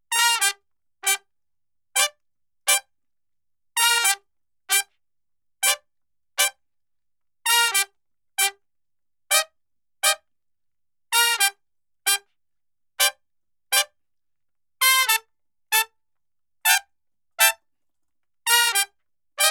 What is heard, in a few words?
TRUMPET